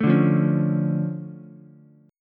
D Minor Major 7th, D, Ukulele; 2,2,1,4; DmM7 strummed; JGuitar

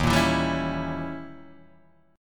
Eb 7th Flat 9th Eb, Guitar Open D

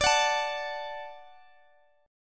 D Major D down Guitar DADGAD

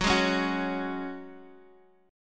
F sharp Suspended 2nd, Guitar, Half Step Down

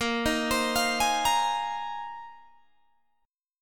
A sharp 9th Suspended 4th A sharp Guitar Open G

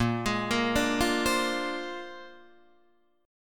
A sharp 9th A sharp, Guitar Open D

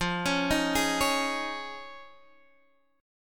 F Minor 7th Sharp 5th, F; down; chord shape

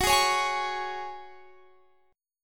F sharp Suspended 2nd, guitar; F#sus2 strummed; x,21,18,18,21,21; chord shape

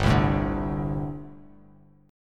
G Suspended 2nd Suspended 4th, Bass Standard; Gsus2sus4 strummed; JGuitar

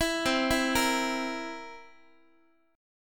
C 7th C, Guitar, chord; arpeggiated; JGuitar

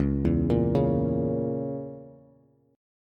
Db Minor 6th Db Bass; Dbm6 arpeggiated; 9,7,8,6; JGuitar

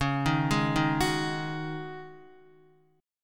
Db Suspended 2nd Suspended 4th Db, Guitar; arpeggiated; JGuitar

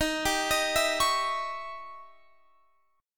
D sharp 7th Flat 9th Guitar Open F; D#7b9; arpeggiated

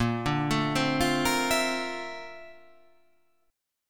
A sharp Major Sharp 11th

